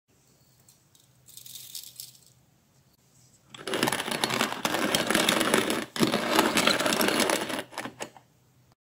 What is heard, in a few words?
Coffee grinding